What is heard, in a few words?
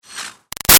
Arrow By Airy Shimmer Fast; Bows and Arrows; Combat; Bow And Arrow Sound Effects; BigRoomSound